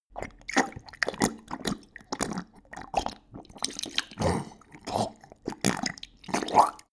dog drink

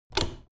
door plastic handle pull down hard

